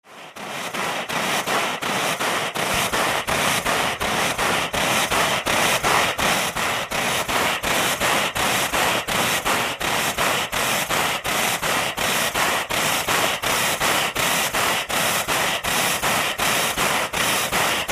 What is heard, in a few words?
Steam Machine Blasts